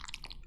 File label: Slimy, Slime; rimworld animations